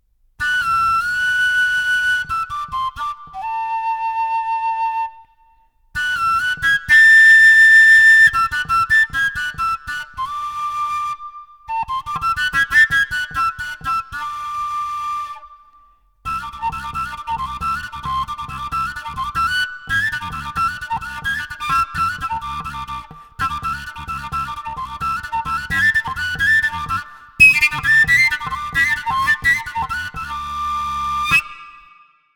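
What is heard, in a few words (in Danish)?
tilinko fløjte; eastwind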